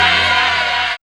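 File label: synth effect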